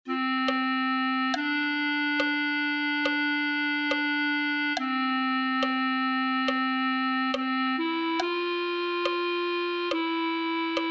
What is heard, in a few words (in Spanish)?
clarinete